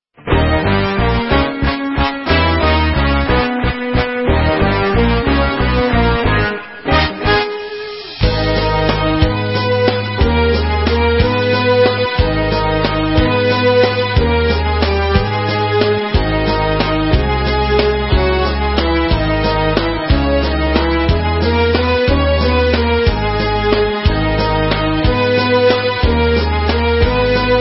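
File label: Instrumental, Israeli Dances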